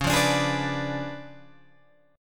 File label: Db 7th Flat 9th Db, down, Guitar Open G